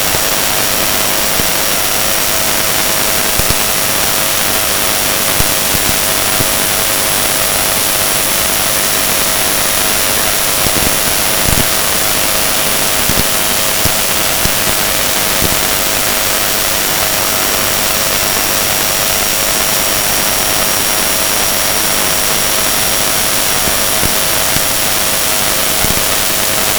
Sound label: sdrsharp iq, 10086 kHz